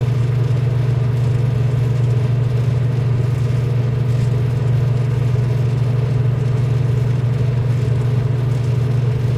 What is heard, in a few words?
microwave; Golden Crayon Sounds